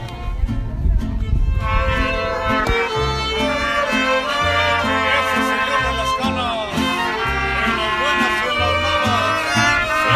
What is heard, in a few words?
Mariachi